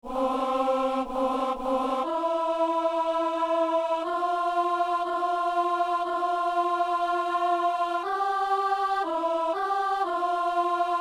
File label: alto